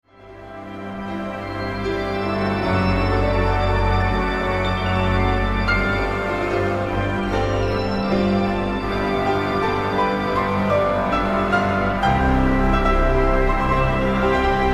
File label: Music